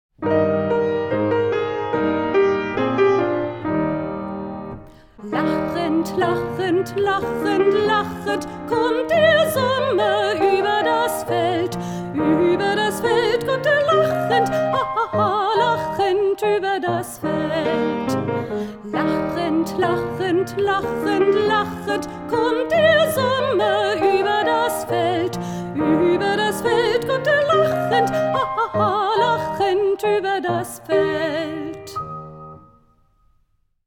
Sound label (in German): Lachend 2 Durchlaeufe mit Gesang